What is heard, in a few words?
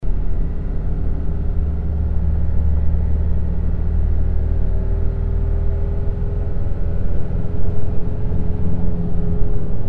MAN G90